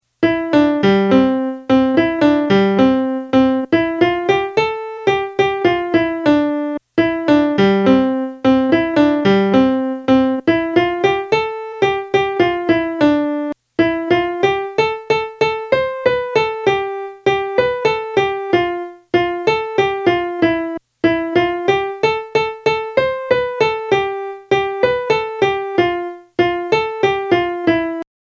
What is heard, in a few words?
melody